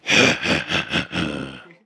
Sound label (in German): lacher tief, vocals